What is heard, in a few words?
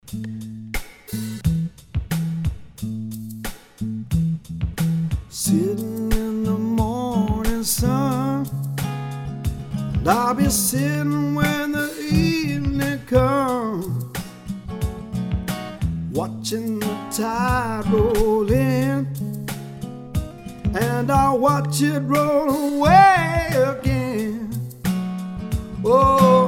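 Acoustic Solo; English/Jamaican solo acoustic musician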